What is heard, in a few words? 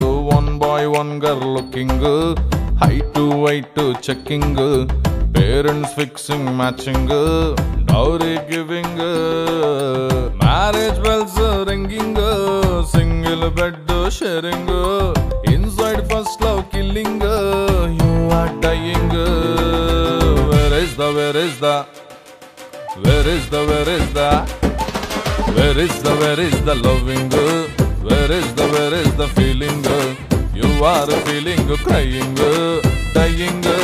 Song Ringtone; Majili